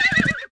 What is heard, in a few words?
Stumble